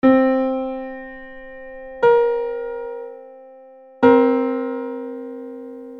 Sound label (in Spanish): tono dissonante